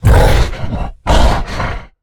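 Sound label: Stealth Attack; Evolve; Goliath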